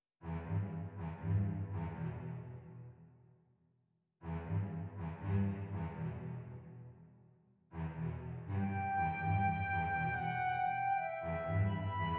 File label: Emotional Moments Deep Sound